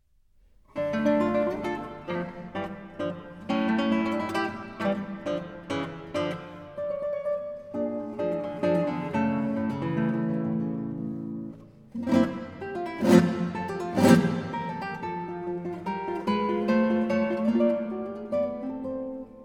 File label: Minuet No. 27 In D Minor